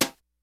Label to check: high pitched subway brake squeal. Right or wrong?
Wrong